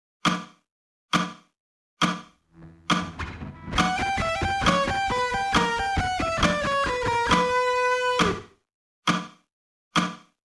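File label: alternate picking slow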